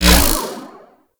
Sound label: Kick Punch Whoosh; Warframe; Corpus